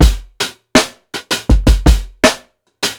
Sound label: piccolo kick fill, breaks; Basement drums vol 2